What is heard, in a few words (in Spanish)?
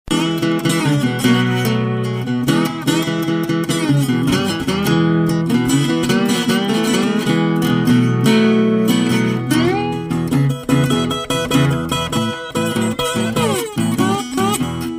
Blues; honduras musical 9no grado